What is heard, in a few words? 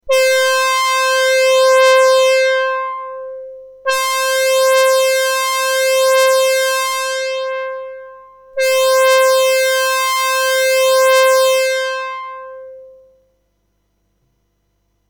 Moogs